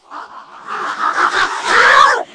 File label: strangled; Messiah